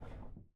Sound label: pedal U, piano pedals